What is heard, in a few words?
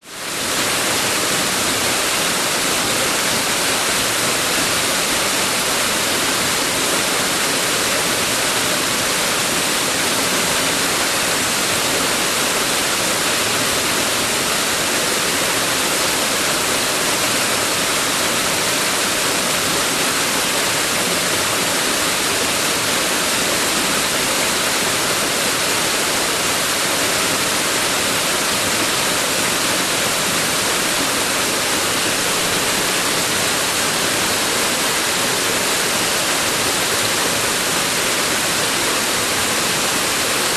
nature waterfall forest slight distance